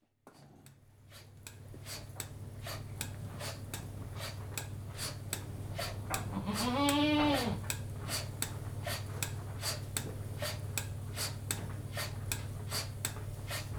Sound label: One Goat Milked By Machine; Livestock; BBC Sound Effects Library